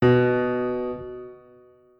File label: piano B2